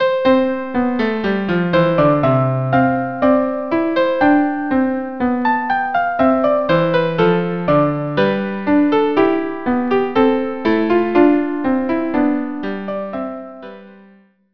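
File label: almand violin cello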